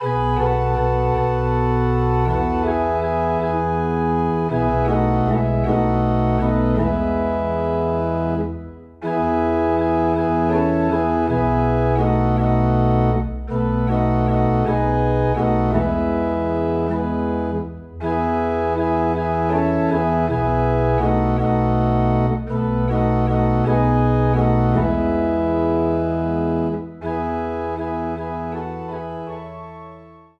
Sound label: Organ in E